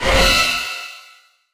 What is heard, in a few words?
Fear Confuse Horror Scare